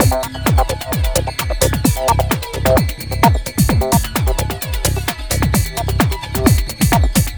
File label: Synthetix, Processed Percussion